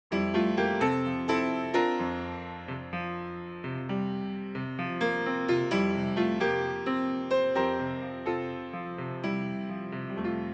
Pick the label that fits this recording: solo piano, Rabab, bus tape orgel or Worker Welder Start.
solo piano